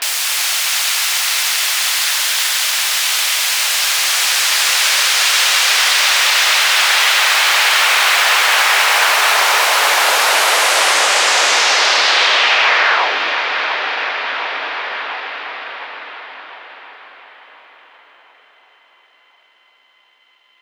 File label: barndoor, Rumbles